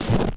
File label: snow footstep; minetest; capturetheflag